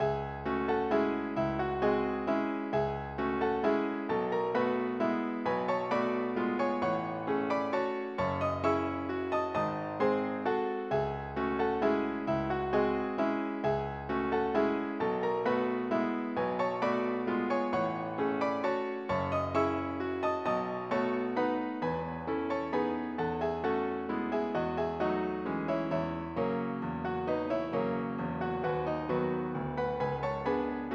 waltz; Total Web Page 99

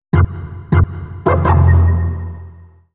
D+B 160; eJay

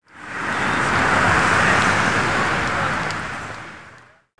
TRAFFIC, commercial

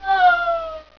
Fall Off a Cliff; Jim Heid’s Sounds

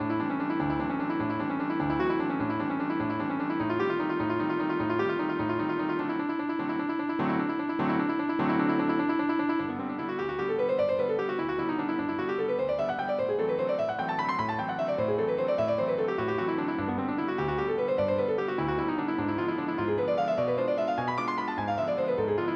waltz

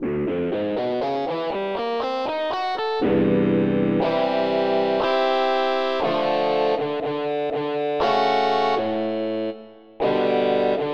Overdrive Gt; EverQuest

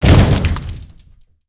rock hit; pcdemo

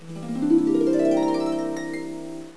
harp

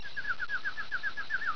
mosq

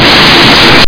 snare; percussion

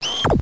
Screech

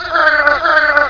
Alien Death; BOOM

